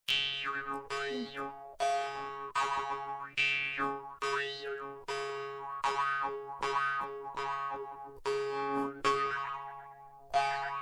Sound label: tatarski kubyz mini, vargan